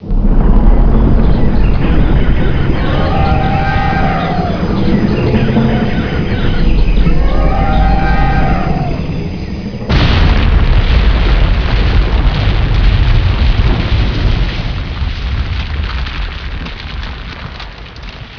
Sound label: volcano